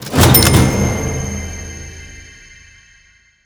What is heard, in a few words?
UI Coin Total